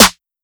808 Snare Clapper; Slate; Vintage Drum Machines Volume 2 808 Edition

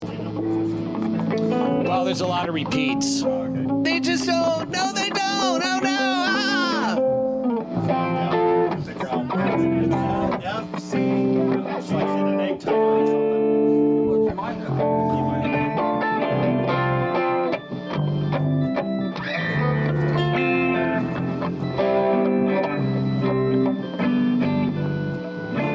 Background Noise Mix

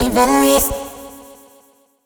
Vocal one shot C#m; Slate Digital Omnivox Vocal Sample Pack

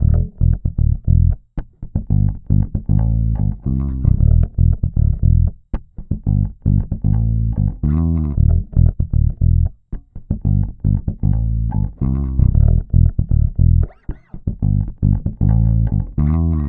Bass loop Wahoo 115 E, Electric Bass Loops Direct; Slate Digital BASSHOLE Sample Pack

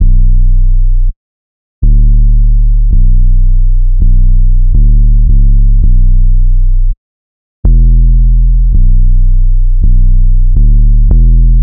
808 loop 165 Bm; Slate Digital BASSHOLE Sample Pack

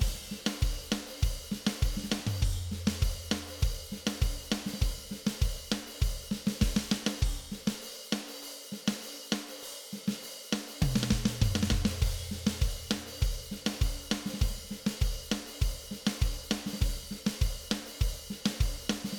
Cumbia Chorus

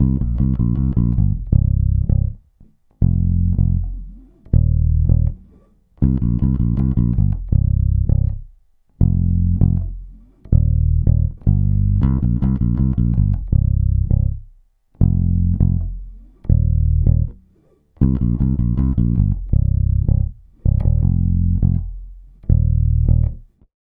Bass loop 80 Cm, Electric Bass; Electric Bass Loops; Slate Digital BASSHOLE Sample Pack

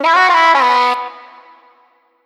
Vocal Sample Pack Phrases B

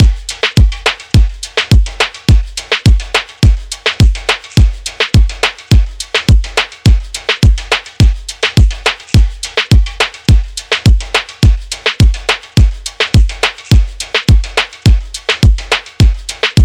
drums 105, Full Drum Loops; drum sample pack; Nisyros; SEISMIC; Slate

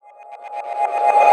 tonal oneshot Db; Pop; Slate; PASADENA Pop Sample Pack; Tonal One Shots

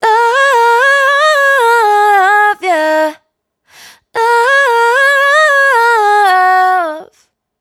hook 115 Ebm, Dry Vocals; Raw Vocals; Love Ya; Slate Digital Omnivox Vocal Sample Pack

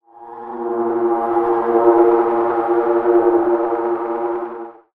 vocal fog Bbm; SD AURORA Sample Pack; Slate

